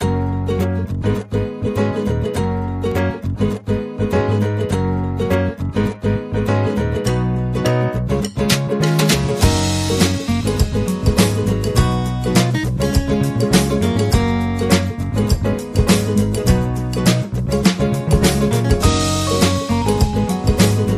positive steps

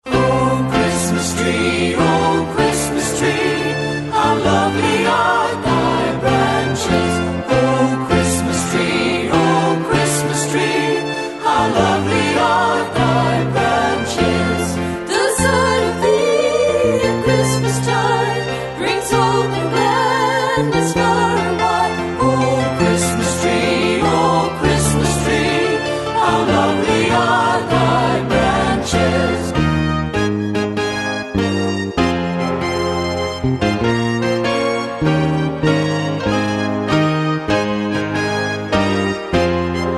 o christmas tree

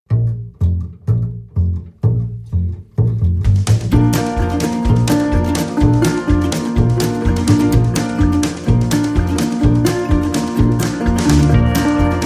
country train ride

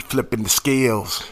Rap Vox

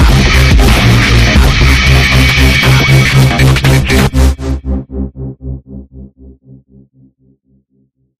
Modern Digital Dubstep Stinger; bbc

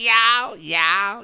Rap Vox

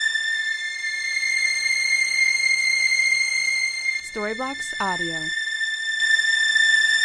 Ambient House Pad 136 BPM C

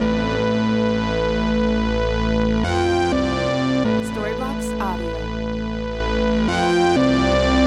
Ambient House Lead 125BPM A

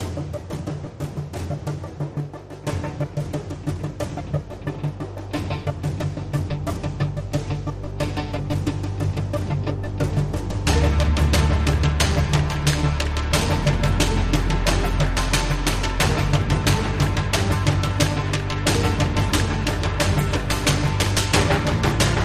Alt Percussion

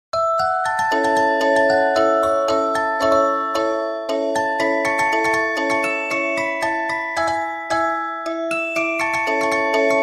Shell Be Coming Round the Mountain Music Box

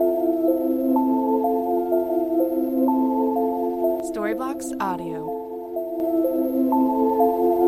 Ambient House China Bells 125BPM E

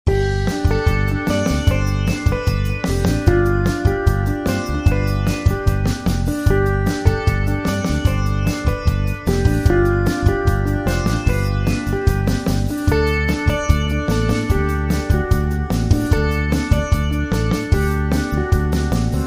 groovy

